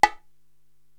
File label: Bongo Hi Open, Korg TR-Rack